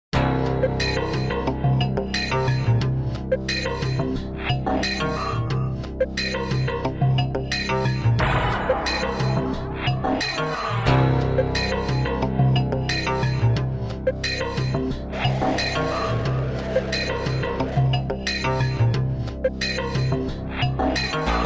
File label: Heavy Machine Like Slightly Evil